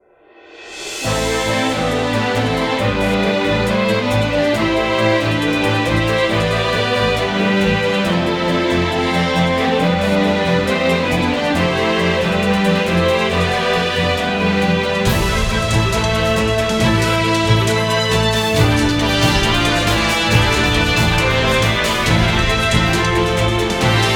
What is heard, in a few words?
Bgm dungeon steam locomotive